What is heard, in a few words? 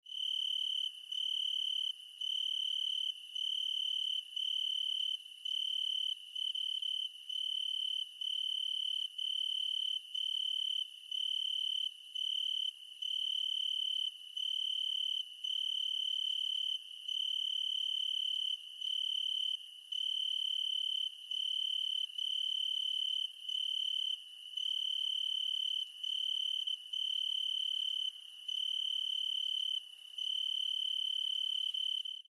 cricket